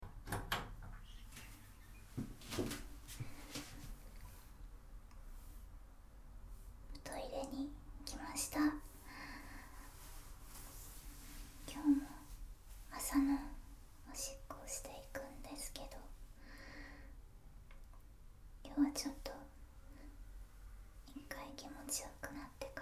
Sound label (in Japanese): 11日目のおしっこ ローターオナニー; 寝起きおしっこ11日分